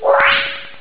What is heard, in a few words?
wzzz